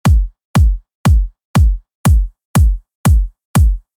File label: excit